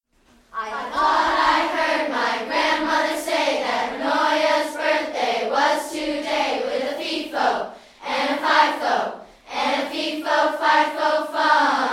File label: Birthday Cheer